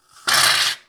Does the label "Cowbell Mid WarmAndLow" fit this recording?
No